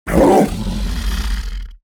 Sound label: creature humanoid fishman bark